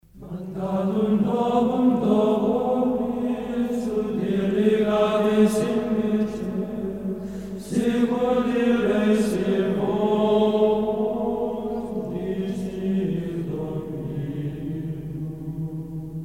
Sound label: antiphon Psalm in Mode 3, Chant, Benedictine Monks; Mandatum novum do vobis; Psalm 132; Santo Domingo de Silos